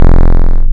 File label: Square To Triangle, wavetables; Animoog